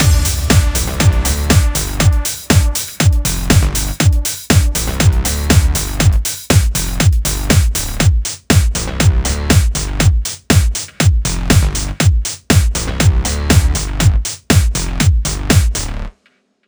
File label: Funk Outro; Funklift